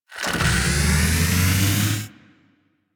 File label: Mods Motor Large Start; Warframe; Liset; Hub; Machines; Tenno Mods